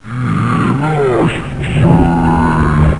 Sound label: growl